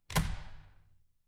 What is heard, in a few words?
door open